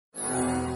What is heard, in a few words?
match 4; john carter; lost symbol